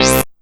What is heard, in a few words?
Synth Hit C